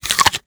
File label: consume cherry